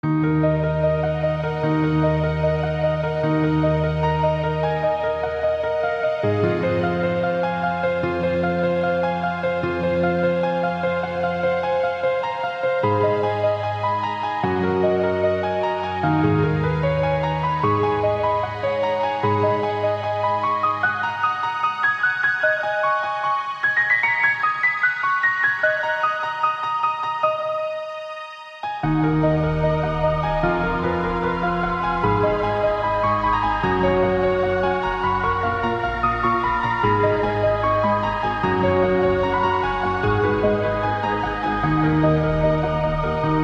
Piano

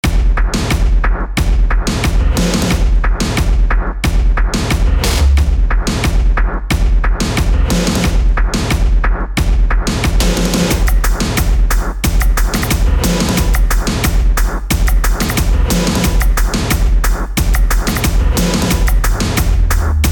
HipHop

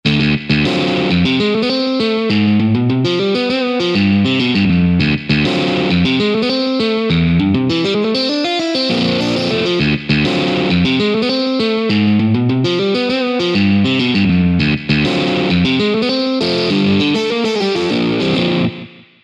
funk

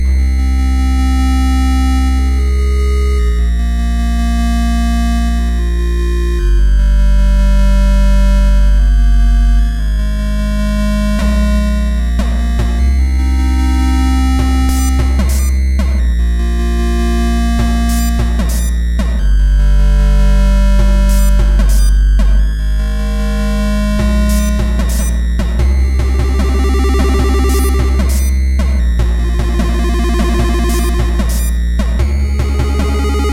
slow tune

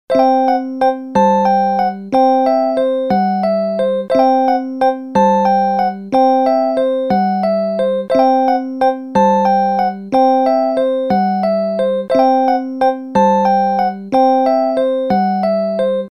ta-da-daah

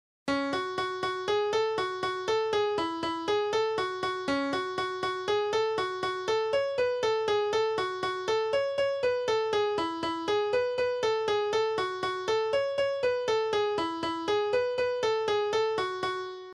polka

